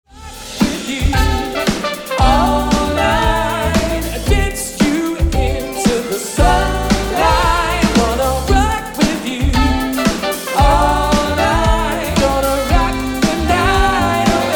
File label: Soul Band; Surrey